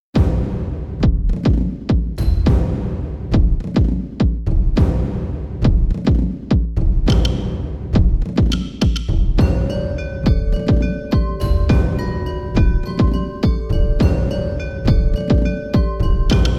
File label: Gamelan Groove